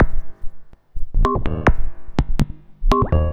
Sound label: Mod Loop, Modular Percussion